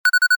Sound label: homing missile lock; weapons; grubs